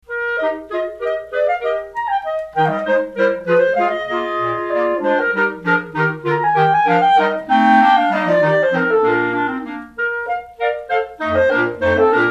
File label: Quartet, clarinet; Crusell